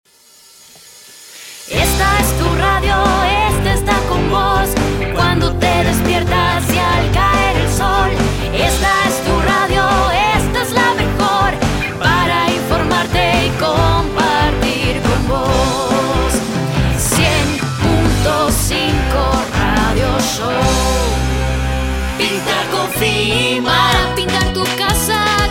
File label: jingle; jingle factory one